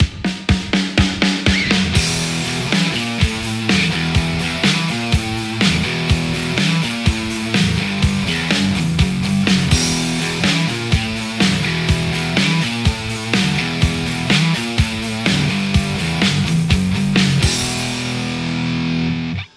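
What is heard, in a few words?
Stratocaster; rcvprod amplistortion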